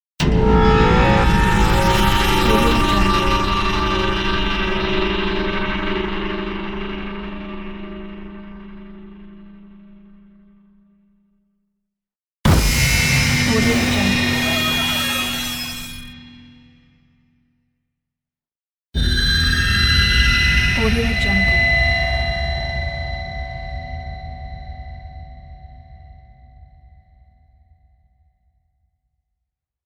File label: intense horror sound pack